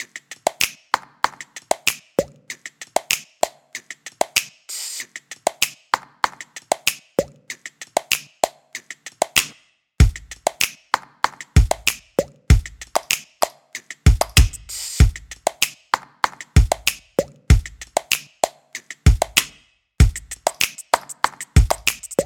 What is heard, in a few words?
Body Drum Loop